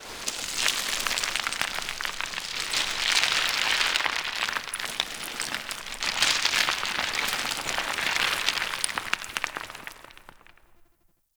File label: Large Rock Crumble G; Ambience; Warframe; Grineer